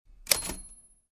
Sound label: Smith Corona 1940 vintage typewriter margin bell